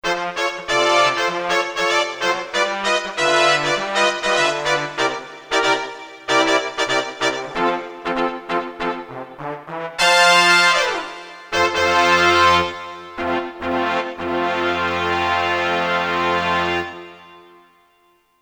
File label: BRASS, Korg DSS-1; Brass & Sax